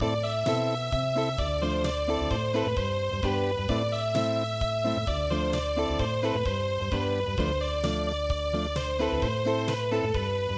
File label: Acoustic